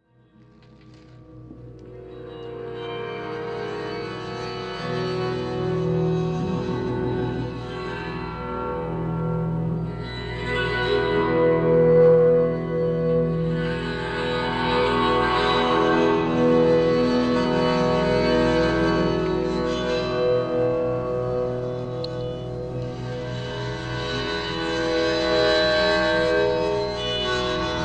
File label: 12 tone poem